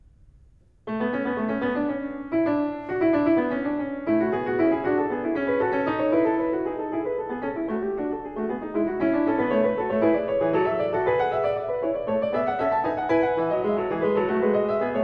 Prelude And Fugue No 19 In A Major BWV 888 Fuga, Clavier; Johann Sebastian Bach